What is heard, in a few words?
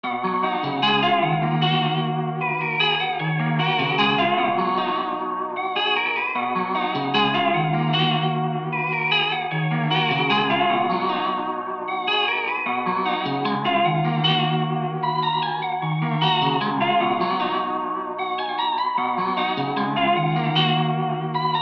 F maj 152 BPM